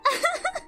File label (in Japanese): あははっ; 壺